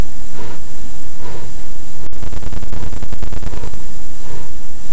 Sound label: Bear Huffing; alaska acoustic highlights; MAMMALS